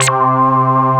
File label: DANCE SYNT, techno trance; E-MU Formula 4000 Series Vol. 2